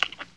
eating chew; MiniGames

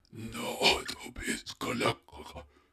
Waterway; Gs Waterway

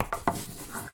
Special Photo Crayon Place

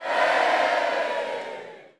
snowman crowd; MiniGames

